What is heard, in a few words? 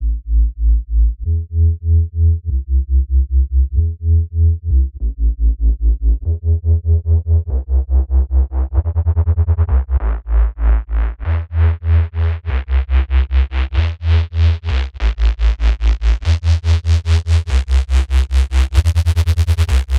DNB BASS